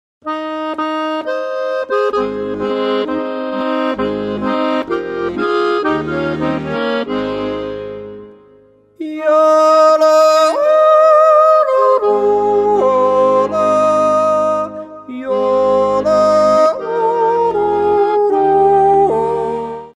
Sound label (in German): Jutz